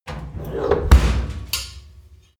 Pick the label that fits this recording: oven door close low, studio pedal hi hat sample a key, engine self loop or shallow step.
oven door close low